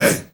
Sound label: HEY HEY